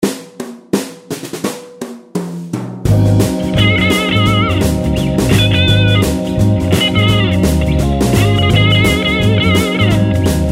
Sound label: Blues In Ab 85 Solo Guitar; Blues Solos